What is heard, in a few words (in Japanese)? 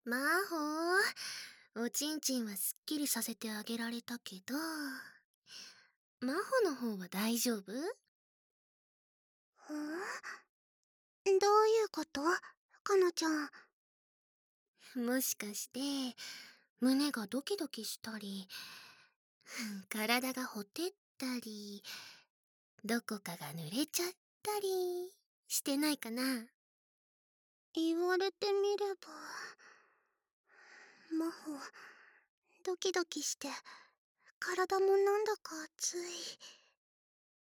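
ドスケベセックス＆耳舐め; かなとドスケベセックス＆まほの性知識ゼロ耳舐め♪; 効果音カット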